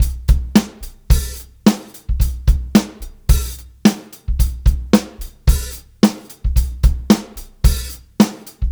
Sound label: Live Beats, R&B Drums